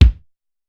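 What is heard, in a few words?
Smak Kick, drums; Alesis SR-18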